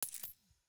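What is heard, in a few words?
environment building sold coin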